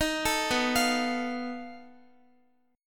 B 7th Flat 5th B Guitar; B7b5; arpeggiated

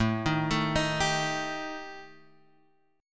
G Suspended 2nd Sharp 5th A

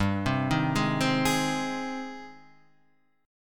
G sharp Major G, guitar chord; arpeggiated; JGuitar

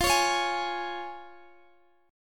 F Minor Sharp 5th F down Guitar DADGAD; strummed

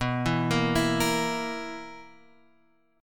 B Major 7th Suspended 4th B down Guitar Open F; BM7sus4; arpeggiated

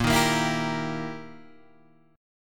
E Minor Major 7th Flat 5th, A sharp

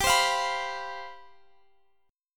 C Major 7th Suspended 4th, G, guitar chord

